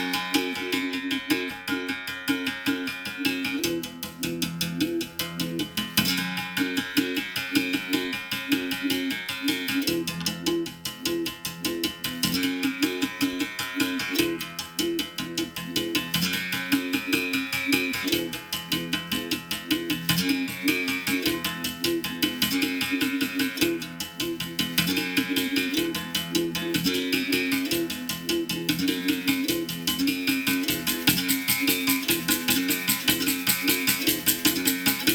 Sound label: Berimbau